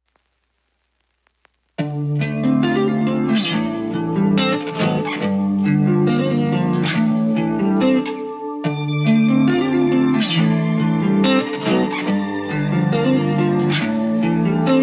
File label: official instrumental